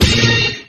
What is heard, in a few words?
splash